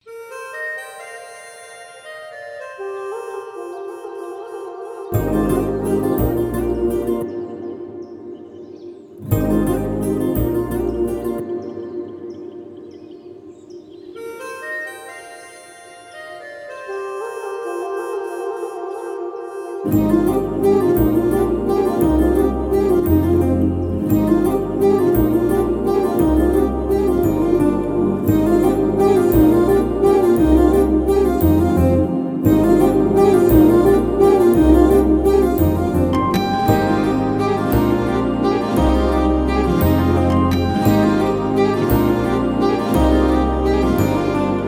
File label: Love BGM Mix; Guntur Kaaram